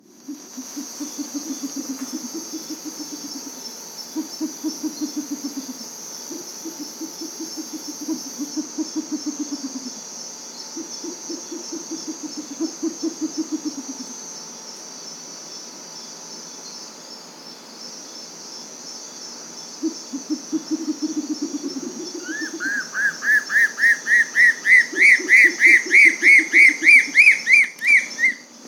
Bare legged Owl

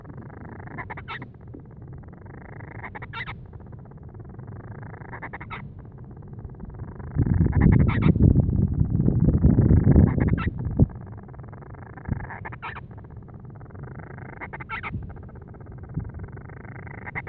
Band rumped Storm Petrel Oceanodroma castro; Grants

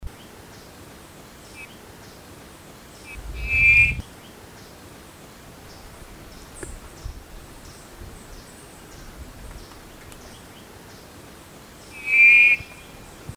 Jamaican Solitaire

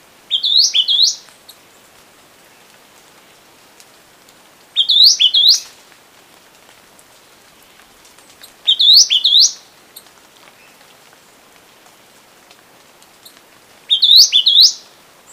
Jamaican Vireo; Hoyer

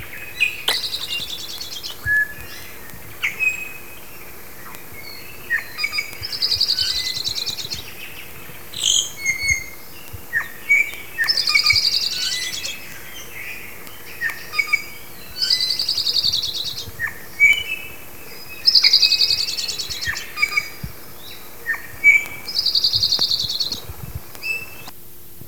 Hawaii Creeper, Hakalau Forest; Maulua; EVanderWerf

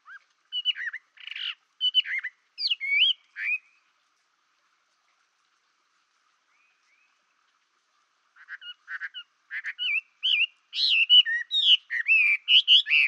Chinese Hwamei

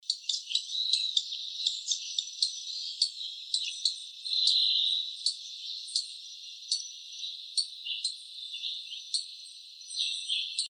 Arrowhead Warbler; Bowers

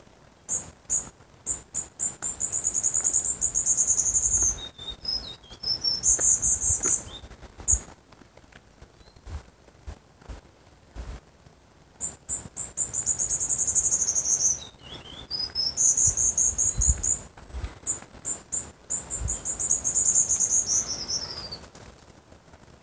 Cuban Bullfinch Melopyrrha nigra

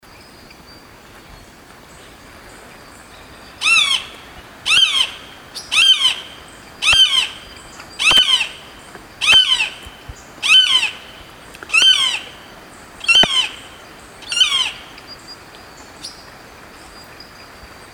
Hawk Ridgeways

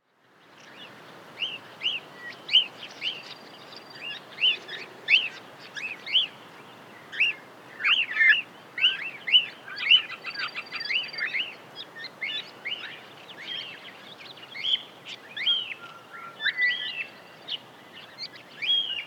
Cockatiel Nymphicus hollandicus

